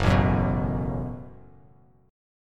F sharp Minor Double Flat 5th F sharp, Bass Standard; strummed